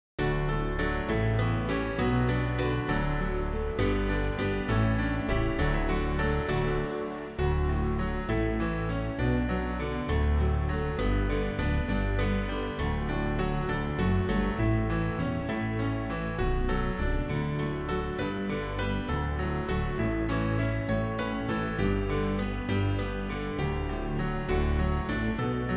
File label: backing; New Hope Music